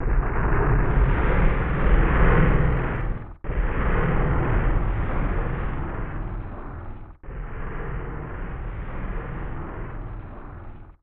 Explosion

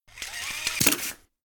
Robot Jump

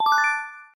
Level Up Sound; Pixel Gun 3D

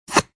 Suction; sushi cat

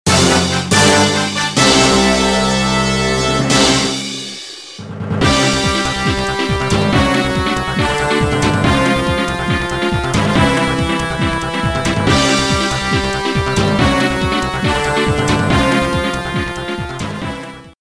Victory!; summon night twin age

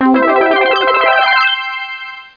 Jingle Result Best; Gotouchi Kentei DS

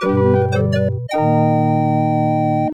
Jungle Fanfare; IBM PC AT; Indiana Jones and the Fate of Atlantis

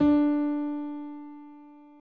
D Piano

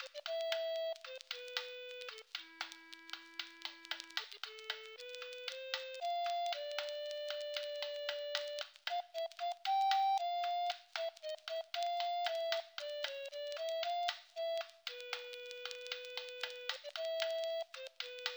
Merchant Loop; Jump King

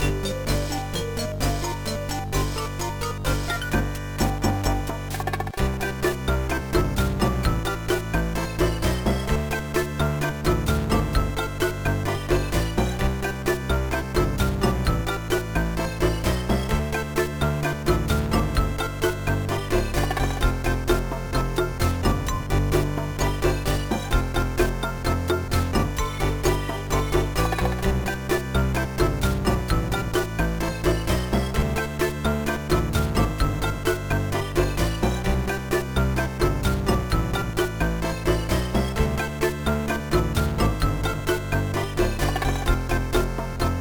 BGM; Ochaken no Yume Bouken; GBA

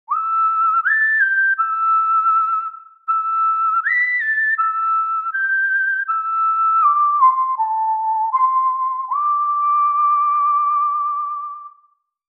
whistle; Yo-Kai Watch 3; 3DS

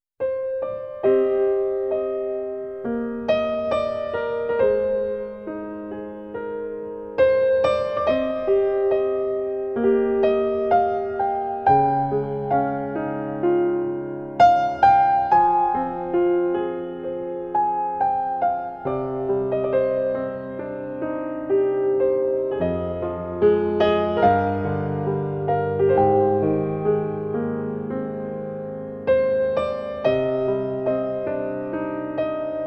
Piano Version; Xenosaga

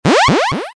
Engine Core awake

